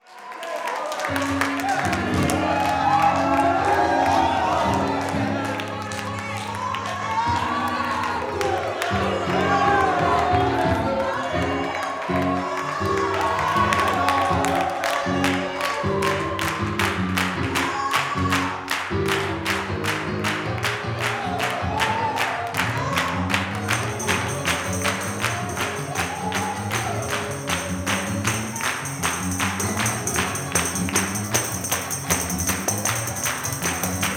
GO2CHURCH, Vocal Planet Gospel; Spectrasonics; Vocal Planet Vol.1 Gospel; akai

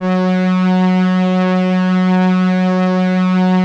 Atmo Pads; Best Service; XX Large

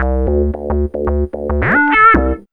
B LOOP

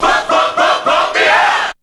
VOX; ZERO-G; Datafile

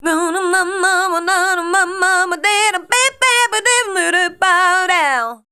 RHY LINE, Voice Spectral; RHYTM

